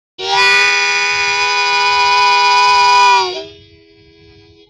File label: freight boogie